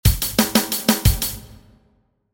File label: beat